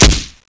mp5 fire; weapons

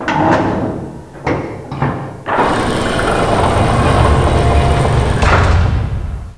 cell open, ambience; caged

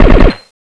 m16 sd burst; weapons

